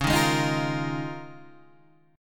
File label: Eb 9th Suspended 4th